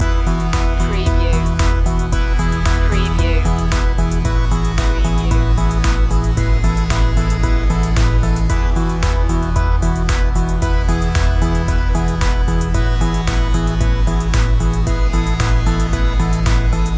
modular motion loop

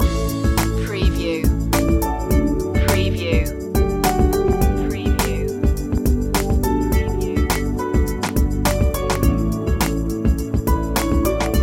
downtown groove loop

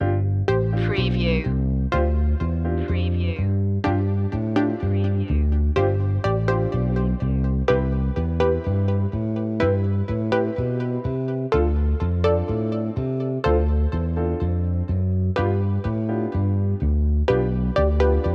carefree day out loop

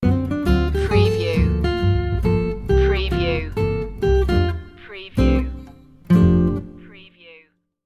sting

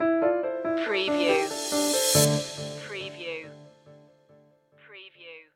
mind matrix stinger

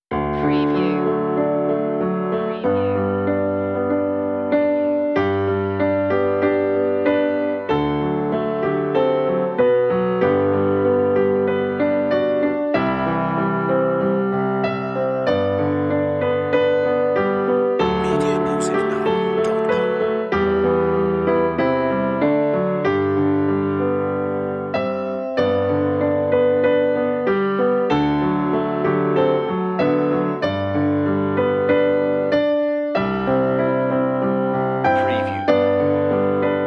auld lang syne roomy piano 95bpm

